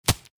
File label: Bullet hit body impact